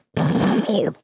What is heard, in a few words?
weeewooo, vocal